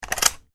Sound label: Metal Catch, Small, Close; Latch Sound Effects; Latches (Sound Effects:Objects)